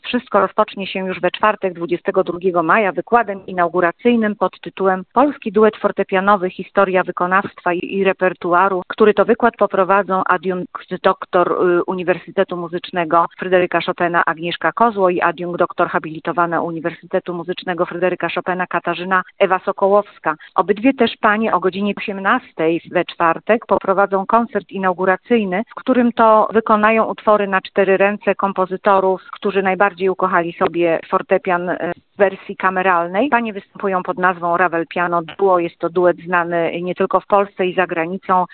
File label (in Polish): fortepian; viii miedzynarodowy konkurs duetow fortepianowych